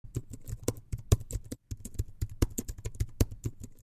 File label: Apple magic keyboard typing